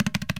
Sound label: INDIAN PERC